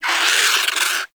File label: Avichaea Vocal Alerted; Hunting Critters